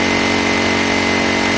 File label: Spin Sound